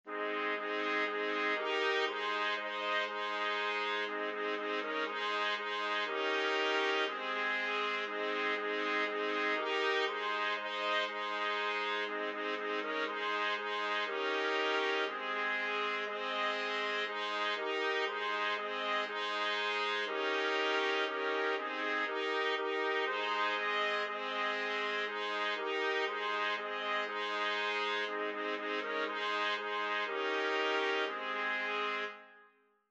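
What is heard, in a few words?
4 trumpets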